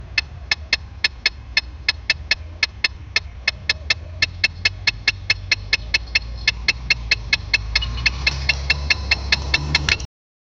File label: mercedes amg one sounds, automobiles